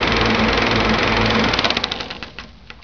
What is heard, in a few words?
WHIRR